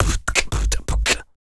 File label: Human Beat Box, Drum & Bass; Loopmasters